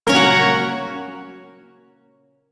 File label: Hirajoshi Chord H; Shawzin; Warframe; Duviri Shawzin